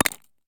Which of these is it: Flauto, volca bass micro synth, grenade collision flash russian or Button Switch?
grenade collision flash russian